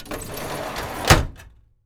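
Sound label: DRAWER CLOSE; DOORS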